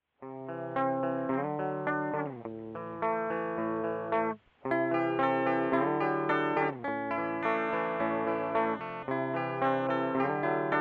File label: analog looper